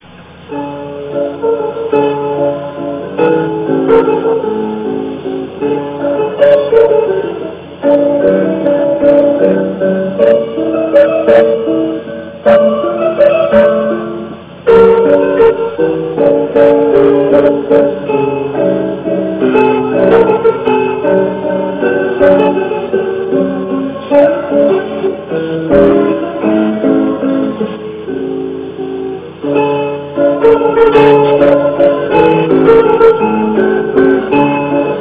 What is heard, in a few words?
waltz, guitar